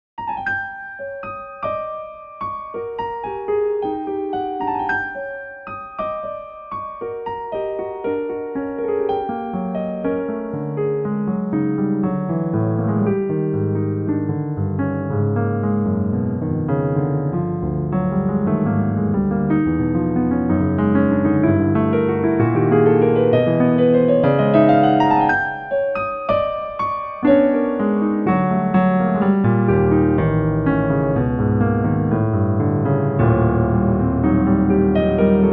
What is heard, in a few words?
impro, bosendorfer; Ermanno Novali; pianoteq8